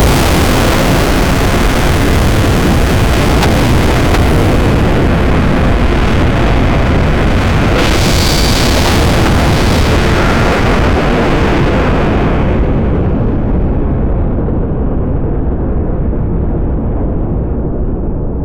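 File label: very loud thermonuclear explosion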